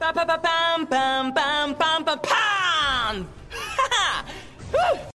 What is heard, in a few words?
Whistle; Prompto